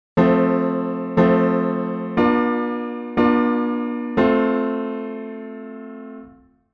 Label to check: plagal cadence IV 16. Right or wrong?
Right